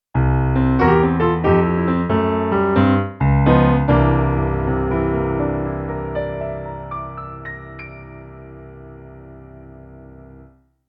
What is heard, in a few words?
Blues Ending Key of C